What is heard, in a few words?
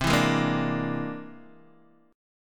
C Augmented 9th, Guitar, Open D